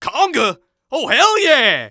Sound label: Scout taunt conga fun